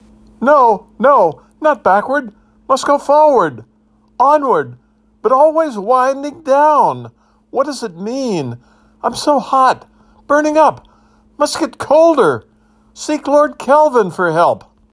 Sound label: sleeping